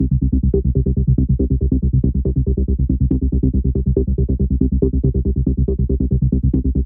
140BPM C; Vengeance - Essential Clubsounds Vol.4

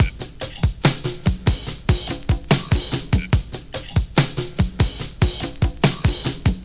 LOOP, Drum Loops

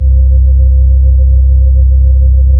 B-3 Chorus

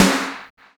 Snare, Drum and Bass

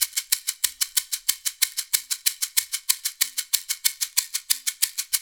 AFRO, African Contemporary, AfroTriangle; Spectrasonics; Supreme Beats

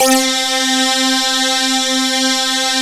DANCER SYNTH; CMP - Analogon

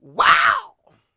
YELL, MALE HUMAN; Spectrasonics Vocal Planet

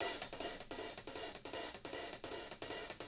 HIHAT LOOPS; Living Drums

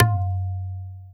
TWO TABLA HI; Spectrasonics; Heart of Asia; INDIAN SET

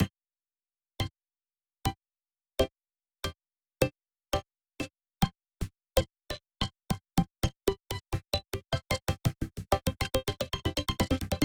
grainup C, pad

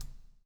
COPS STK; Spectrasonics - Backbeat

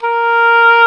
OBOE A#3; E-MU Formula 4000 Series Vol. 5; Protozoa; Proteus2 Presets

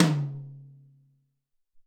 Ntrl Tom, Alesis SR-18

